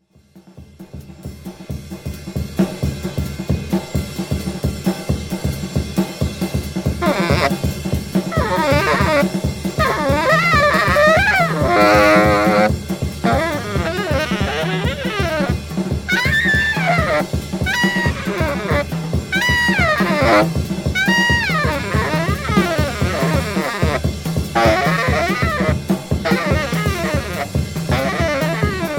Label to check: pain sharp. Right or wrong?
Wrong